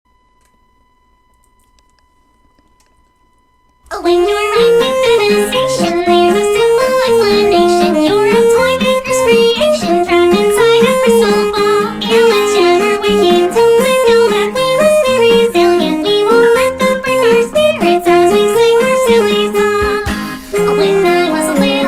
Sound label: Alto Sax Cover (With alittle improv)